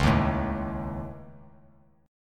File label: Db Suspended 2nd Sharp 5th Db, chord; strummed; Dbsus2#5; JGuitar